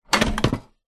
office slide projector vintage set down table 1960 voigtlander perkeo automatic; zapsplat